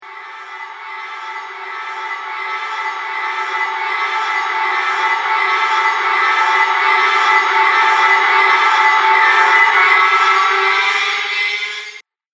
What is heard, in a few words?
Ominous Fear Riser, horror; Scary Soundscape Sound Effects